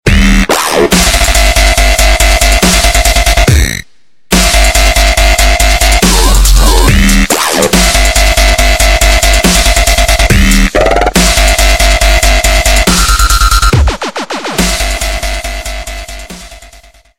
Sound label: dubstep